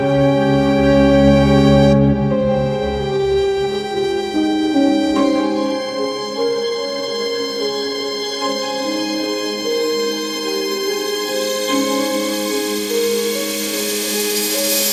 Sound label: orchestral strings